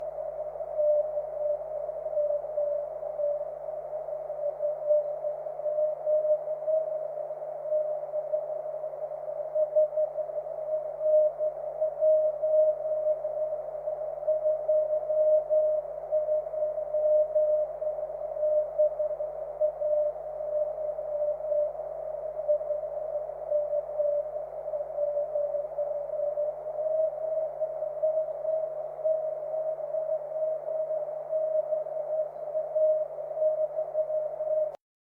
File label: cw